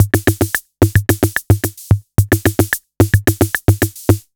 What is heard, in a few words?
Analog 110 bpm, retro drum machine samples, Loops; MT40